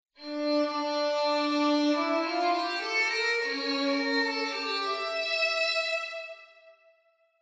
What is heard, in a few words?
short violin